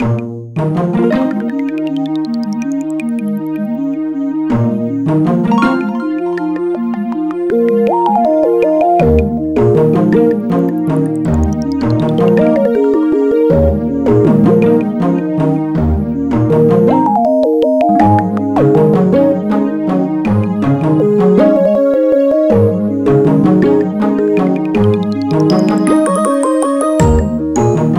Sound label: Background Music; UNO DSiWare